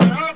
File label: pogo